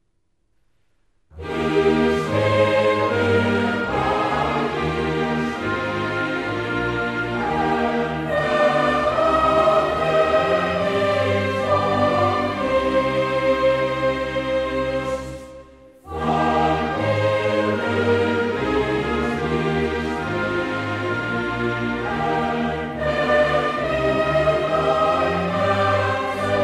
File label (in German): CORO Bass; Buß' und Reu'